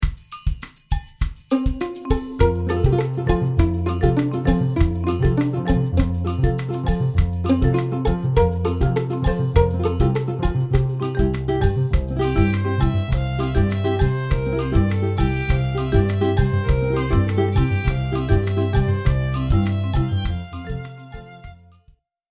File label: jam